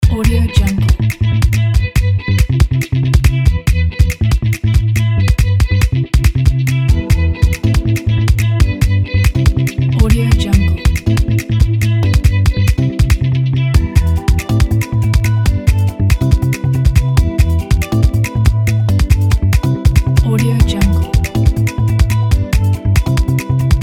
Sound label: guitar music